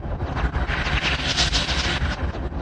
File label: noise loop, 91 bpm; 091 to 100 bpm